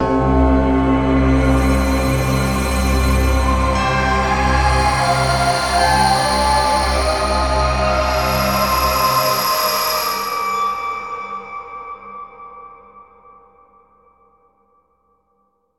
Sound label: Signature Sound; FXHome; Signature Tracks